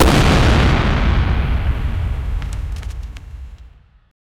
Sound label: violent fire explosion he; apocalyptic